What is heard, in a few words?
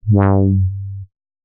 filter adsr